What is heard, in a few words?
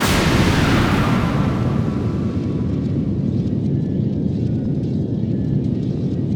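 SCUD LAUNCH, Impacts, Hits & Booms; Distored Reality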